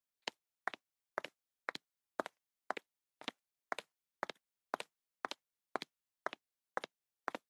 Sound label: steps